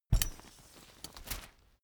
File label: umbrella big close full